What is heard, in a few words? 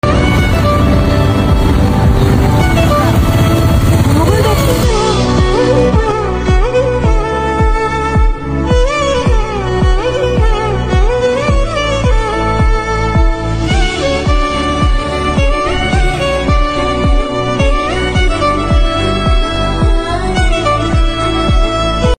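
Tamil background music; amaran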